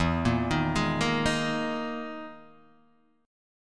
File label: E Major 7th Flat 5th down Guitar Half Step Down